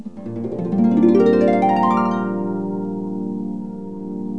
HARP GLISS

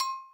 percussion, Millenium MPS-400

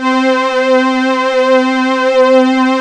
BIG AX60 PAD; OTHER SYNTHS; Rubber Chicken Software Sample Bank

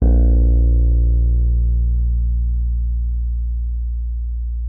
WURLITZER A#1; Xsample VOL-1; WURLITZER B

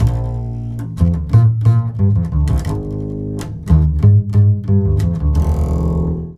FNK, Jazzistic

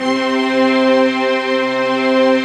x Synth Str, Roland; Club-50 - Foundations

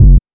Kick; Sonic Foundry (Sony Creative Software); Esoterik Beatz; Mecan Kit

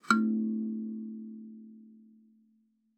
KALIMBA A3; Xsample VOL-7

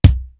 dry kick; East West; Global Sounds

